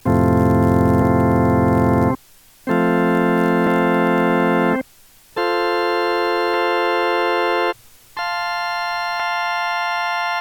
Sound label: chords, hammond tonewheel; capacitors